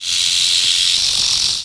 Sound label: snake; outlaws